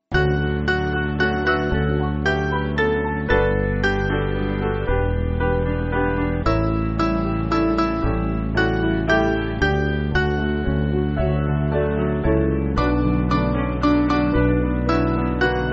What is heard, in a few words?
Piano